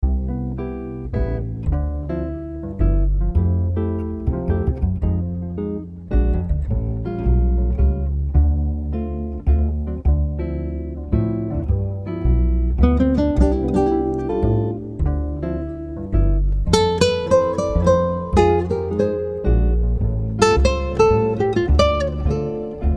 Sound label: Smooth Dinner Jazz Ambience